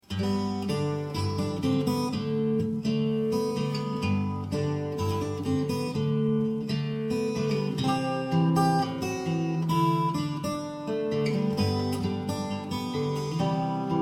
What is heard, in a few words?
fingerstyle, guitar